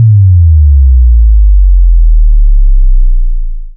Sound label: VFX2 Sub